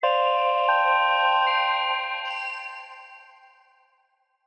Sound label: Victory Short Sting